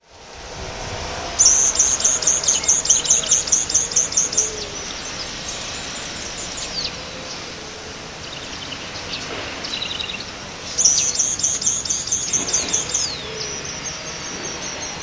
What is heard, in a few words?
Leucochloris albicollis; Pt Ballena; UY